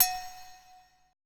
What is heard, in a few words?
E HITUBE, ETH PERC